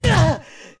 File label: Fall Down; Fierce Deity Link getting knocked to the ground (#3 is rarely heard)